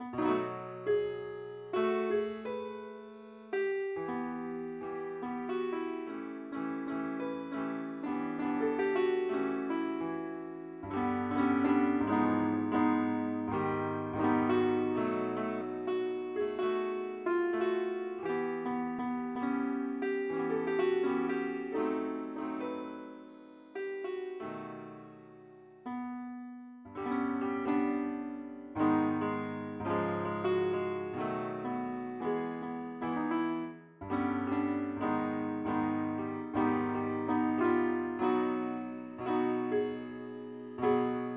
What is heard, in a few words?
piano